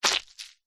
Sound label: cartoon squelch; zapsplat